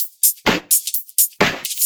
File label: Electro Loop 128BPM; Vengeance Samples